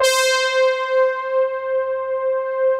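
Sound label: analog brass; Pro-Rec - Synthsonic; TRINITY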